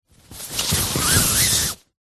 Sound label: foley flag handling movement pull through hand; zapsplat